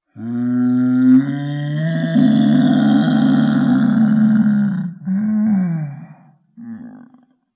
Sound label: Awakening Mummy